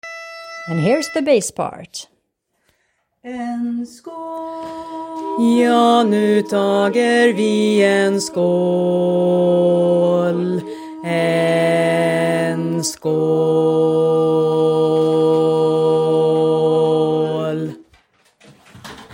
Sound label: Bass